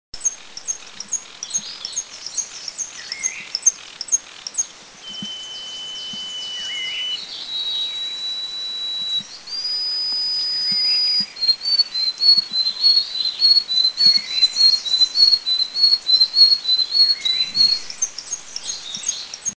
Yellowish-bellied Bush Warbler; Eaglenest; India; song

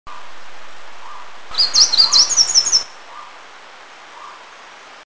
Grey-cheeked Warbler Song, Eaglenest, India

